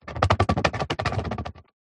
sport soccer ball roll hard ground

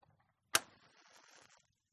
Light Or Strike Wooden Match Close; uberduo

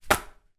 flannel wet throw into sink basin

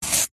carrot chop knife single; food

